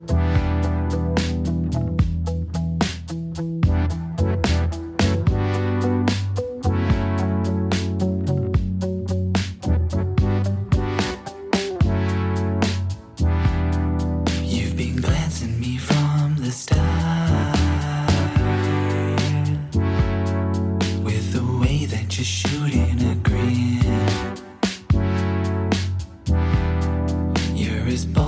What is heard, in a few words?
Slow Wave Breathe Mix